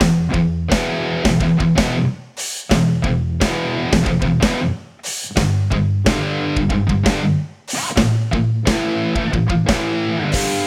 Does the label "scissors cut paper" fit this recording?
No